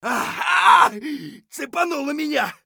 hurt light; Escape from Tarkov; scav1

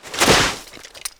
foot prone cement, footsteps; Call of Duty Modern Warfare

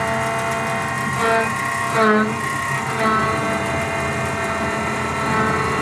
turret, tanks; World of Tanks

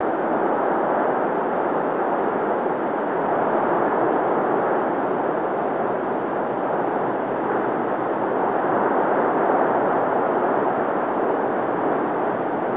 winter wind; ambient; World of Tanks Blitz

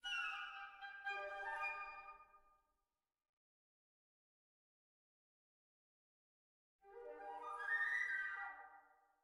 music interactive; World of Tanks

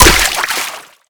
Projectile Sounds; Red Orchestra Ostfront 41-45

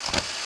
zoom out; World of Tanks Blitz; GUI zoom

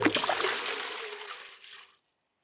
waterbucket fill submerge; Rust

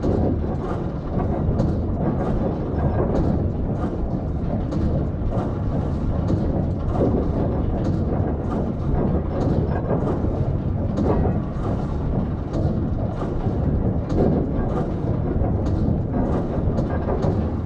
ship engines; World Of Warships

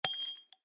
shells 20mm ground; tanks; War Thunder

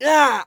hit; Escape from Tarkov; scav2

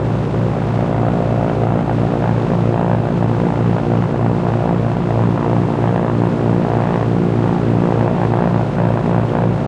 plane; World of Tanks

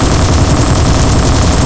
hs404 loop; War Thunder

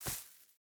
footstep hide grass run; Rust

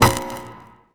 discharge

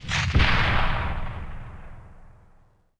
grenade launcher explosion tail

